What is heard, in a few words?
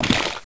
spiderweb slash